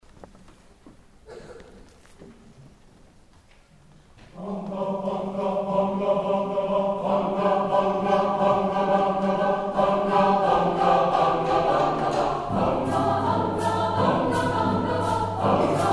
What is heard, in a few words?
Bongo